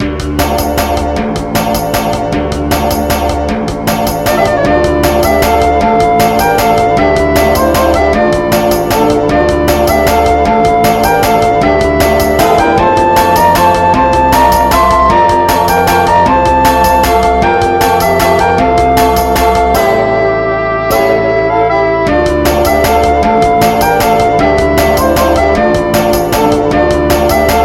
Royal Waltz

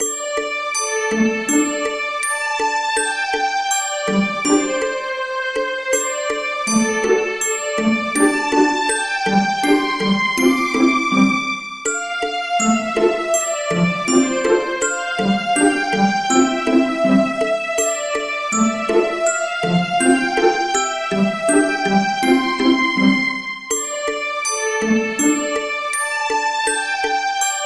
Dark Calm Night

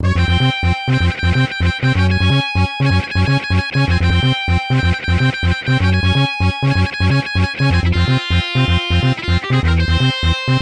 Overhead Battle

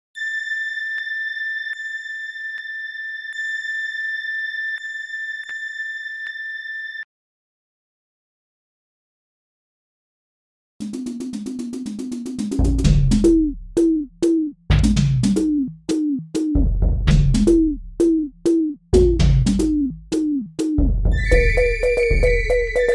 FL Studio Trance Remix; DkC1

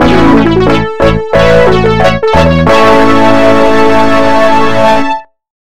Success Win

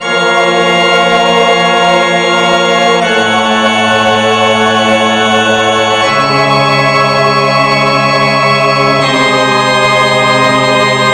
Corporate

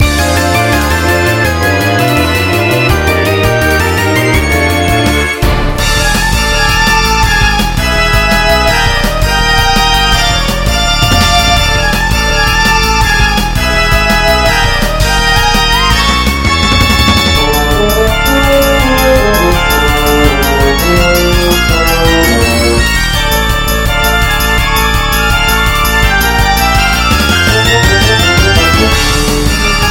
Dungeon Horror

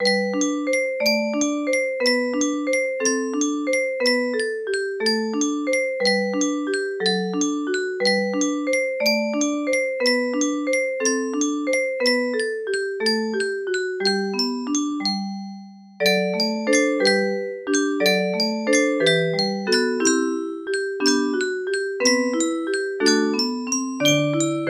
Music Box

